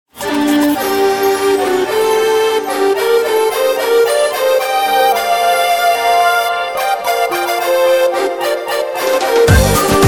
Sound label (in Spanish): Cumbia rasta